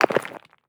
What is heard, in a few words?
Inventory Material Stone UI; User Interface